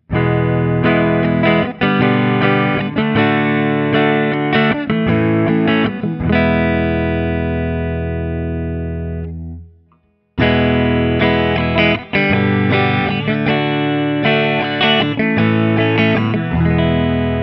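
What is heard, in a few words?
Guild X97V ODS100 Open, guitar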